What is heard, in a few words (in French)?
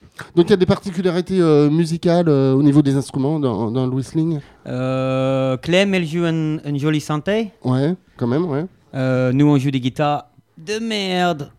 Guitare de merde; Radio konstroy